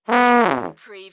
Cartoon Trombone Short

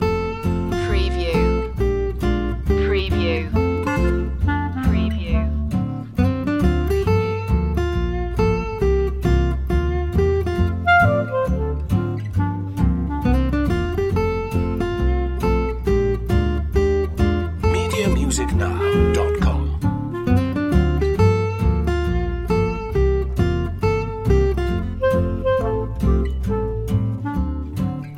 loop

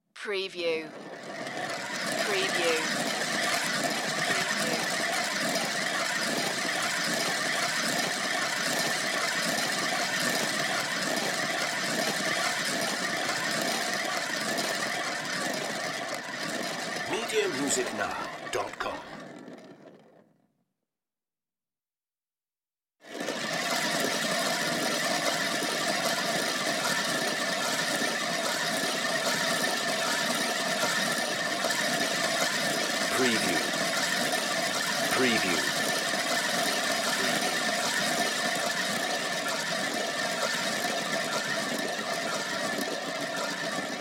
turning marble

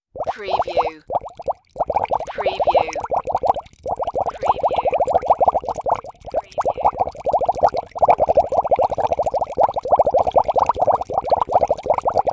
fast bubbling

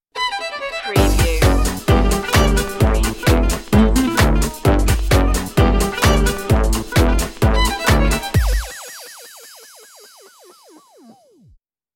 doo wop bop stinger

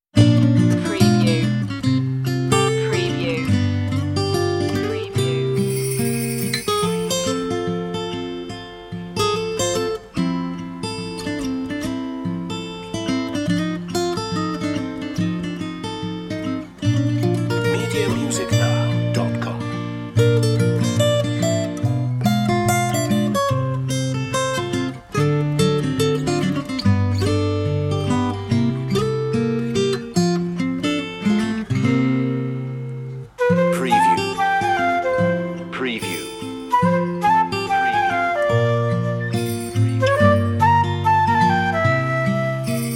jingle bells guitar and flute

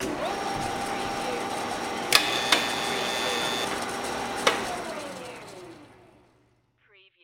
Radial Drill

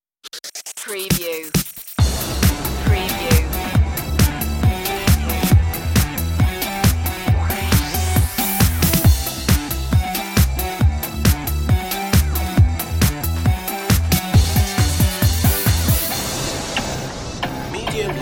digital kutz no rev cymbal

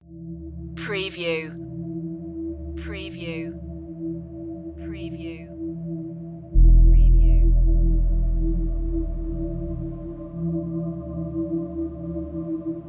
neon nightscape tension no sfx no drums

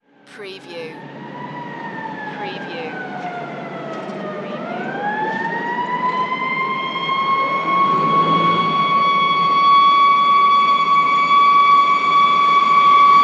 Police Siren Wail Traffic

